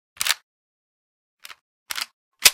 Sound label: gauss reload, weapons